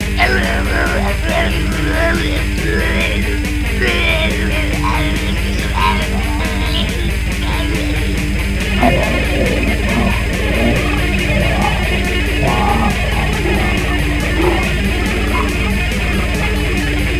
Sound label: black metal saatana